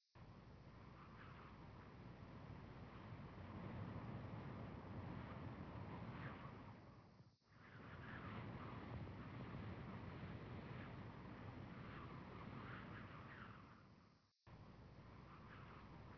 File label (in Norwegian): sommervind